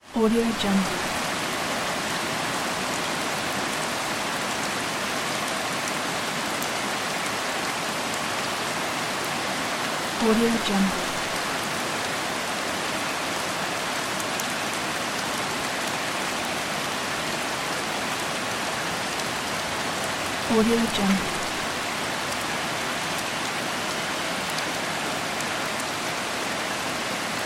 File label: rain; crisstyna